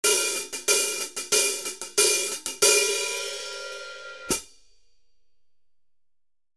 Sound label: 14in Mastersound HiHats, cymbal sound